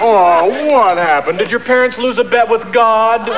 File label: knocked out